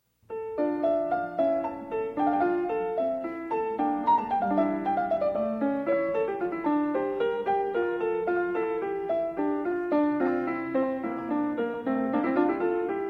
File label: sonata in d minor, piano; domenico scarlatti; l 413